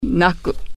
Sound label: cry